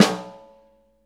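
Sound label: snare drum, JAZZDRUM, right; Ueberschall; Drum & Bass Flash Trackz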